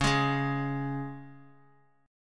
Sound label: C sharp 5th C sharp, Guitar; strummed; C#5; JGuitar